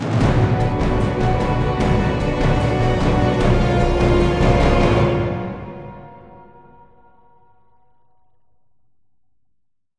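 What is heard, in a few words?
sting death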